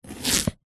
food courgette zucchini single chop knife wooden chopping board